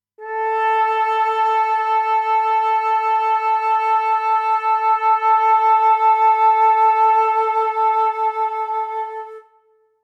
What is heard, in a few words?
Flute; プロの音プロの技・令和版